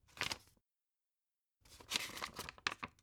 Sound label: old pick page